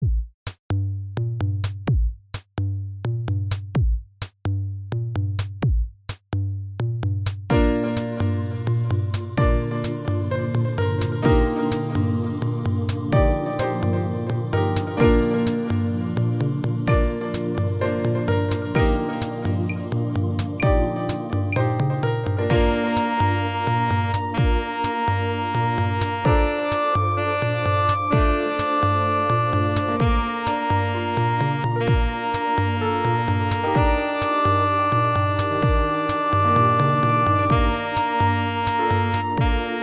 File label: Two Note Chill Practice Tempo B Flat